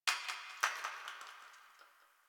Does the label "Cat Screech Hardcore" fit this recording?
No